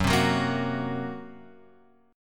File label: Gb Major Flat 5th, Guitar Open F; strummed; x,1,0,1,1,1; JGuitar